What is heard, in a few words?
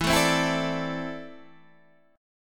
E 7th Suspended 4th Sharp 5th